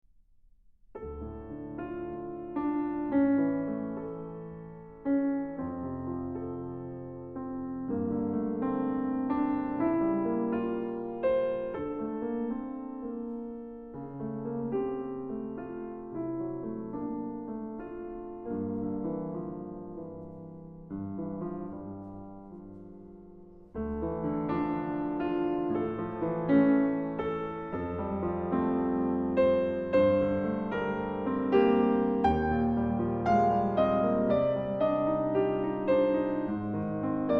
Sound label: Piano Pieces